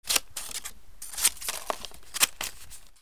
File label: PAPER